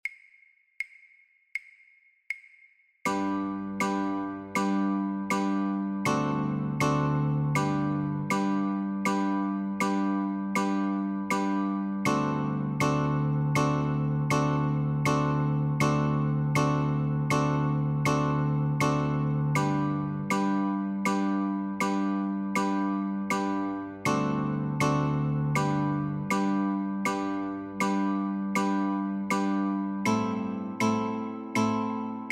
Chords, guitar; christmas

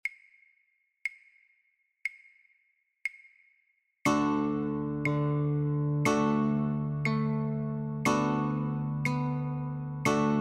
Alphabet song Notes and Chords, guitar; childrens

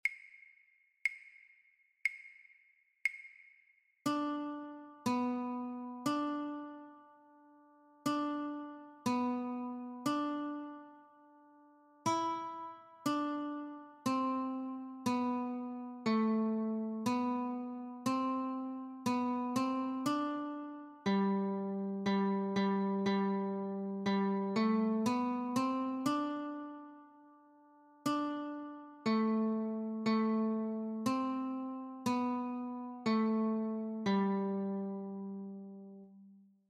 Notes only, guitar

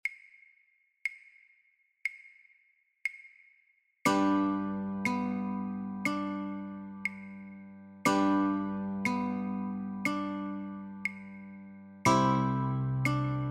This Old Man Chords, Notes and Claves, guitar; childrens

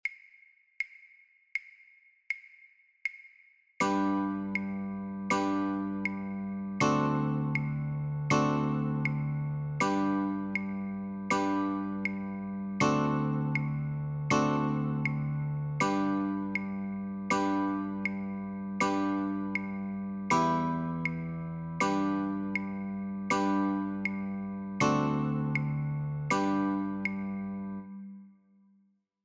Chord, guitar

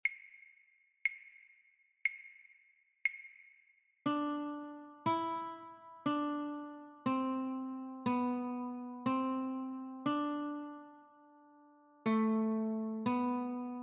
London Bridge Notes Only, guitar; childrens

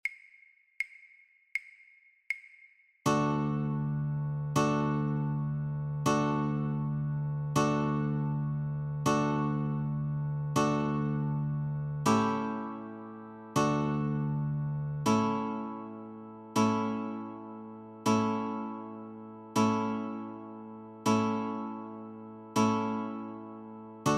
Chords, guitar; Handels messiah; christmas

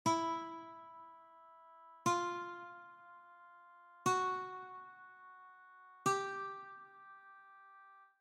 E string, guitar; childrens

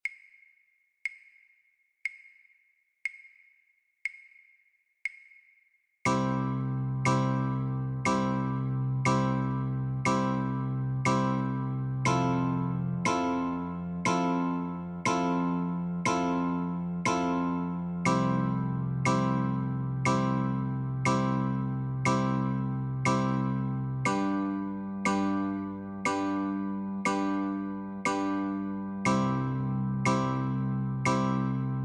Chords Only, guitar

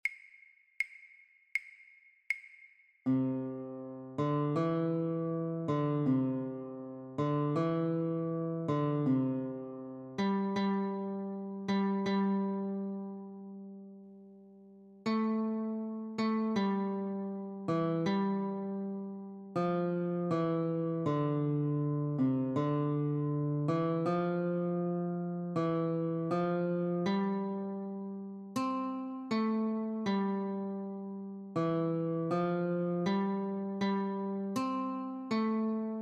Notes, guitar; Here We Come A-Caroling; christmas

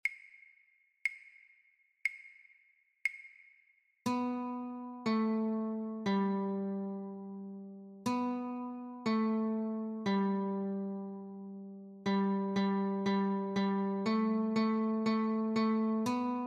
Notes, guitar

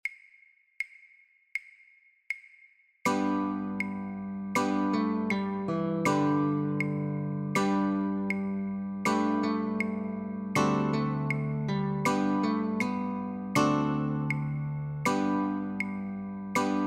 Notes & Chord, guitar; Go Tell it On the Mountain; christmas

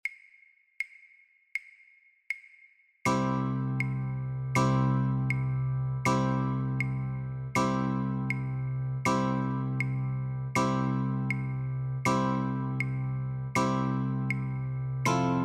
Chords, guitar; Here We Come A-Caroling; christmas

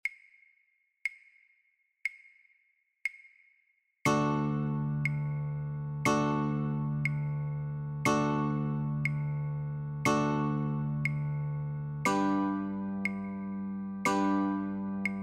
Chords only, Guitar

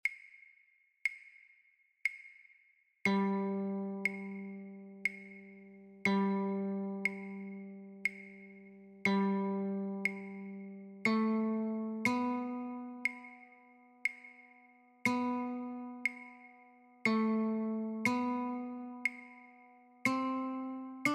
Notes Claves, guitar; Row Row Row Your Boat

